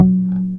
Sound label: bass 3 (creep) F#; Sonic Foundry (Sony Creative Software); Ugly Remnants VOL-2; Basstuff